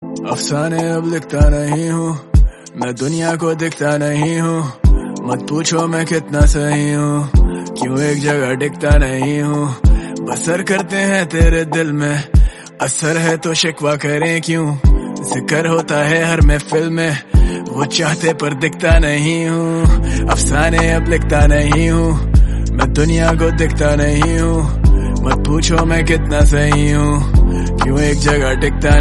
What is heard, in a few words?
rap song tone ringtone, Bollywood